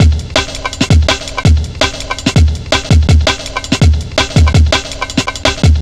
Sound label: 165, Drum Loops, Drum & Bass; Total Drum&Bass